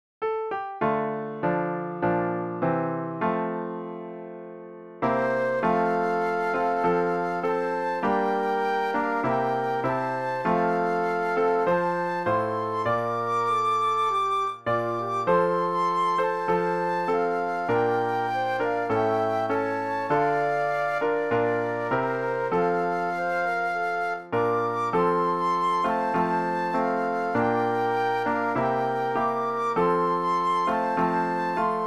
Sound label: Flute Duet and Piano; christmas